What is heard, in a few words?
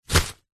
foley umbrella closed catch or set down; zapsplat